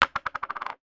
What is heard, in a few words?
plastic biscuit jar lid drop on table